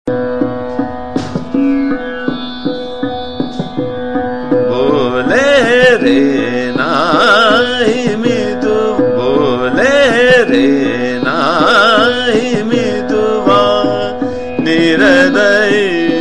Chota Khyal Bole Re Nahi Mituwa Ektal Drut, Bageshree